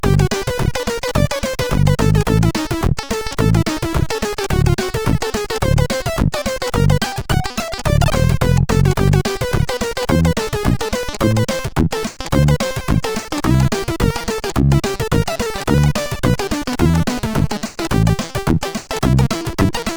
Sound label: D50 tune, CSG8580; CSG8580R5